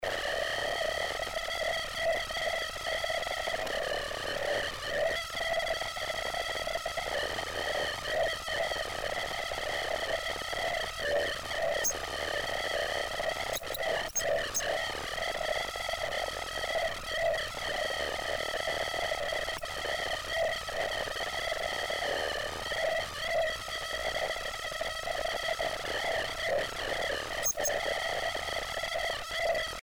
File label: electric gamelan ALIEN GAMELAN